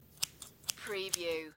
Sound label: scissors snip 3 times